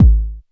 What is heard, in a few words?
K909, KICKS TR 9+8